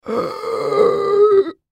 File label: Human Breath Dying Gasp; Horror; Scary Breathing; Blastwave